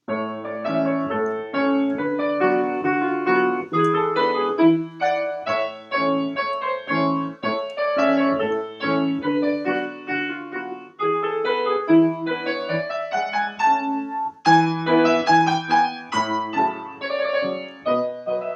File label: finale excerpts